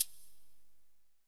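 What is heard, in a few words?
perc, percussion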